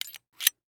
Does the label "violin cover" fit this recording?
No